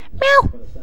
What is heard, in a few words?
Voice Meow